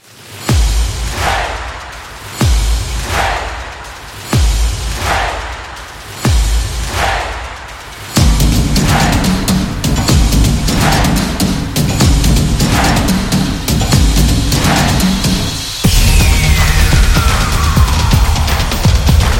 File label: Sport Action Opener